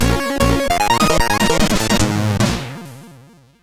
Incidental sid CSG8580R5; Street Fighter II; hvsc

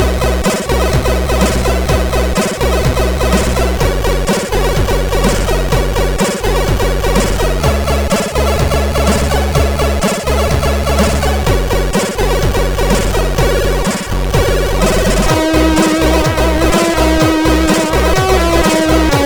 Intro, sid CSG8580R5; Dynamix Intro; hvsc

